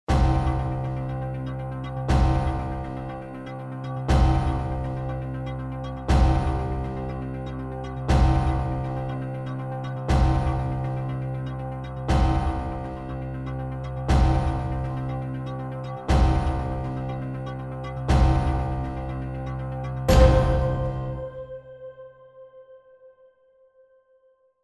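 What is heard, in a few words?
musical countdown with hits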